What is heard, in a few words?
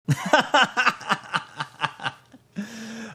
laughing